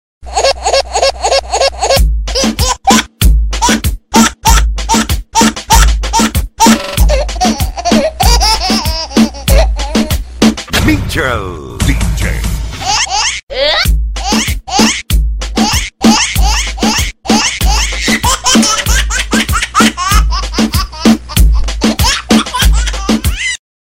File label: Baby Laugh